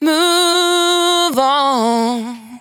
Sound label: 92bpm Bb, Velvet Vocal; Zero-G